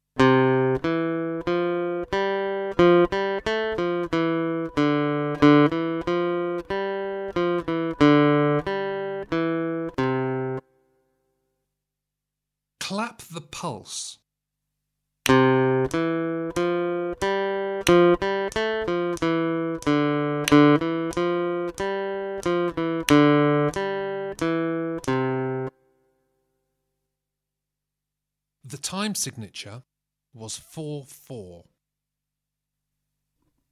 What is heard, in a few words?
Aural Rhythm Test, acoustic guitar